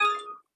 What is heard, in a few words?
ui button click